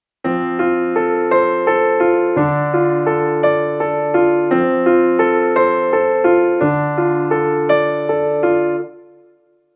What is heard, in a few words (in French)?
balade arpégée ternaire